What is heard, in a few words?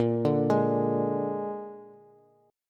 Bb 7th Bb down Bass; arpeggiated; JGuitar